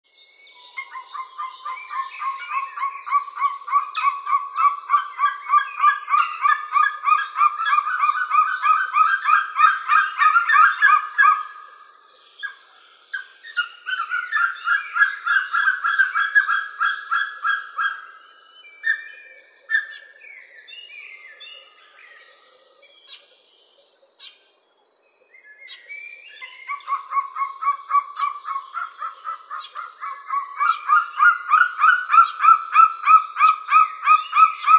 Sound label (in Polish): bielik, birdsounds